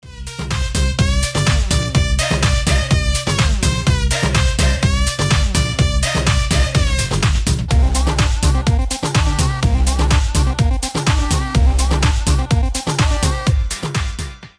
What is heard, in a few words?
Instrumental Ringtone; Radhe